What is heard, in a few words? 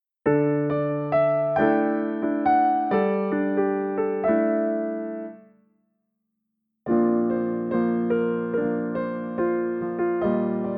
Melodic examples